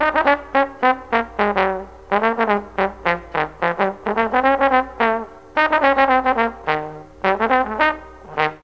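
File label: G3 D BONE, mellotron trombone; DIXIE+TRMBN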